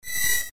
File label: esper party disappear; ff3; ff3us